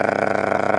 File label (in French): moteur